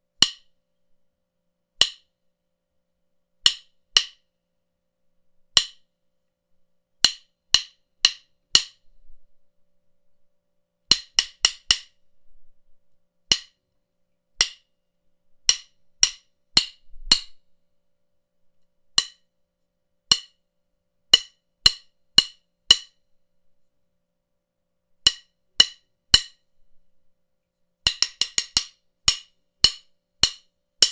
Hitting Drumsticks Together

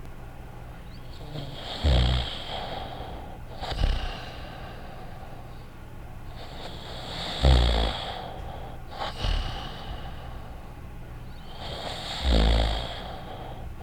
Zzzzzz

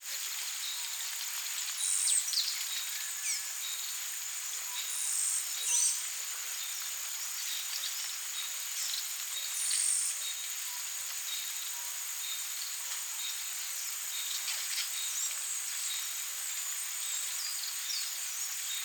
Amazon Forest

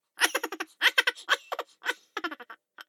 Chipmunk Laugh

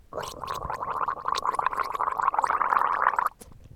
Gargling

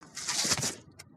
Something Falls Down

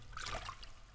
Medium Splash

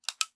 Toggle Switch

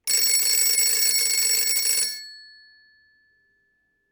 80s Telephone Bells

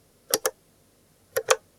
Button Click

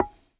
Soft Hit Wooden Block And Sandish Noise

